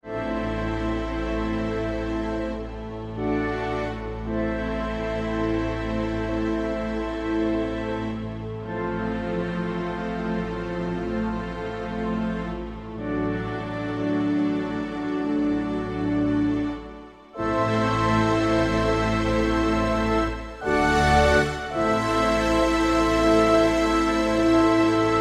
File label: Defeat; English